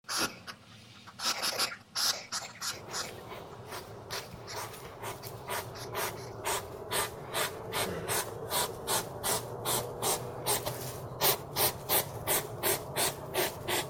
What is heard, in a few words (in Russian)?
Zvuki lesnyh zhivotnyh YOzh